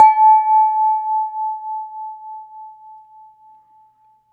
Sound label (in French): verre moyen; 96khz 24b mono; In the kitchen